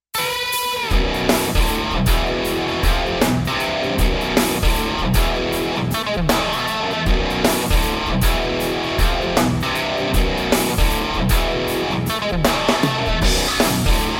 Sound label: double tracked guitar